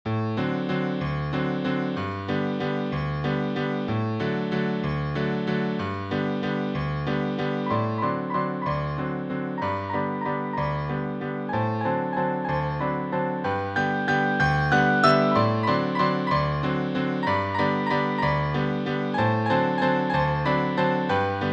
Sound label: Waltz